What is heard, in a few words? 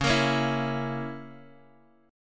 Db Minor 6th Db down Guitar Open F; strummed